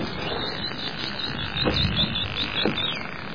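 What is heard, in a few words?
whistling sounds of killer whales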